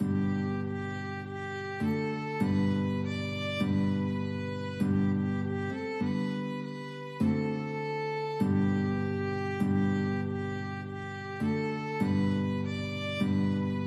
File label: blood of jesus violin guitar